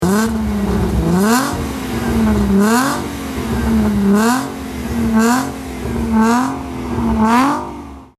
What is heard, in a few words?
V6 blip; unicorse